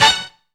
HI TRUMP HIT; Zero-G; Funky Elements

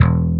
SLAP BASS E0; E-MU Formula 4000 Series Vol. 5; Protozoa; Proteus1 Instrmt

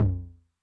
HI TOM, Low Tune Long Decay, 909; classic machines; HT - HI TOM

